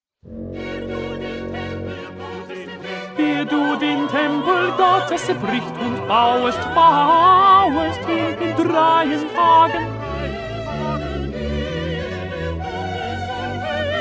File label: 1st Tenor